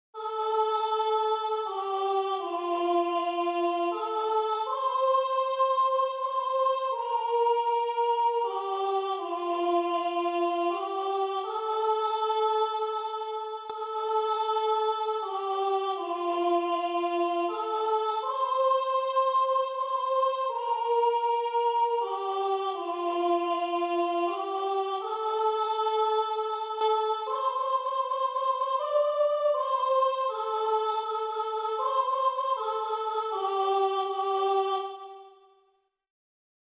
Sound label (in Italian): soprano